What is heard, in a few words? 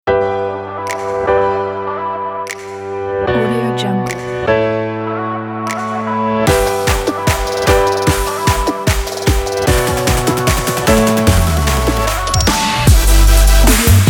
future bass